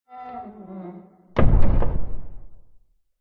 horror dungeon door close reverb

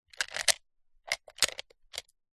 gemstones inside plastic display case movements; zapsplat

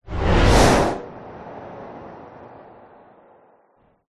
whoosh; orlando lanzini

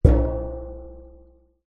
impact metal lid trash gong like; zapsplat

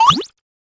cartoon water drip

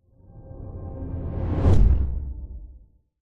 cinematic droning whoosh into hit; sound design

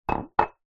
mug cup ceramic set down kitchen worktop; household

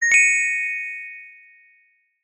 fantasy magic chime ping wand fairy godmother

